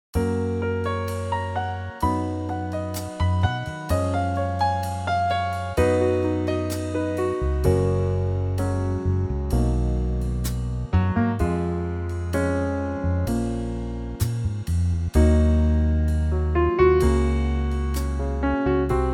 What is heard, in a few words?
Gb Trio